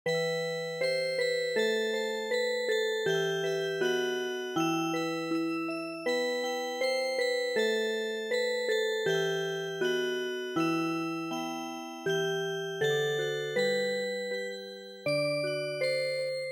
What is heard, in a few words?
Music Box